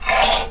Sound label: removal damage